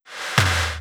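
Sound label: drum Loop 140BPM FX snare; Vengeance - Essential Clubsounds Vol.5; VEC5 Drumloops; VEC5 Multi Loops 140BPM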